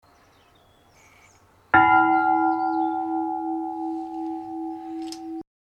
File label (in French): cloche; Chagny